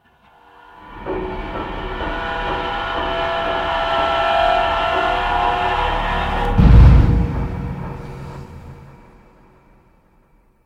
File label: mood; inFamous; PS3